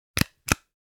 Paper hole puncher single punch

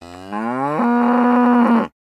Angry bull mooing

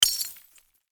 Tiny bottle break

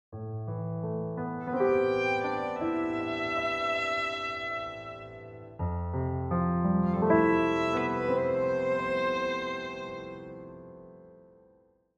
Game over you lose sad dramatic

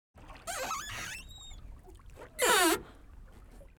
Boat interior door opening or closing

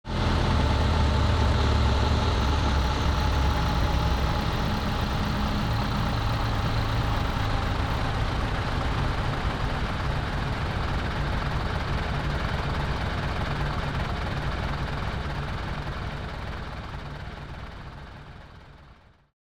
Industrial semi truck deceleration noise

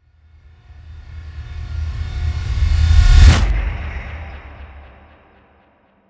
Action trailer tension building

